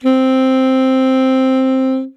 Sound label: saxophone alto C octave0; csound